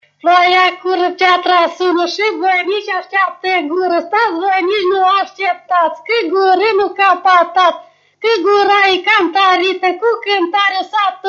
Strigaturi la ciur; Vaslui

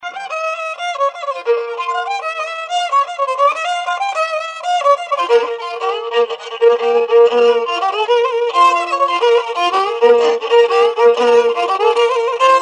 Galopul la vioara; jocuri si cantece batranesti; Vaslui

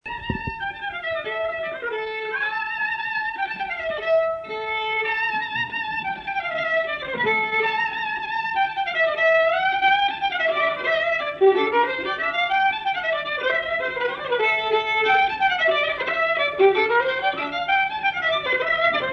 Sfredelusul la vioara; jocuri si cantece batranesti; Vaslui

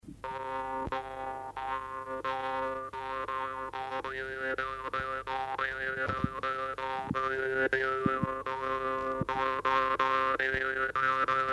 Cantec de joc I la dramba; jocuri si cantece batranesti; Vaslui

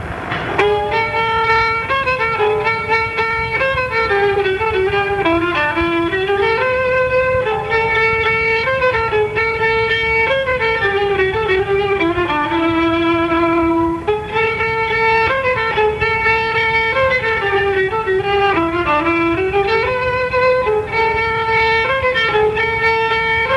Hora mosilor, joc popular vasluian; Centrul Judetean pentru Conservarea si Promovarea Culturii Traditionale Vaslui

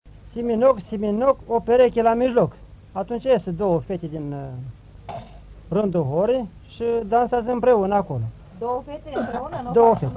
Strigaturi la hora; Fonoteca Arhivei de Folclor Vasile Adascalitei - CJCPCT Vaslui; cantece si obiceiuri de nunta

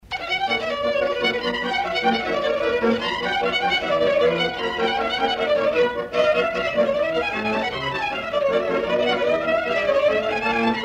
Floricica la taraf; jocuri si cantece batranesti; Vaslui

